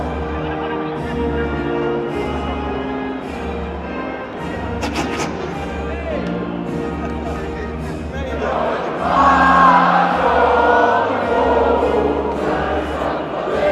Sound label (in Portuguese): hino nacional portugues